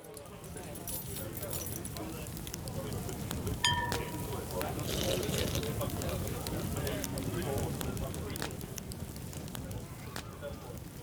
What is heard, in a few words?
Crowd Ambience; Pitch Black Audio Pong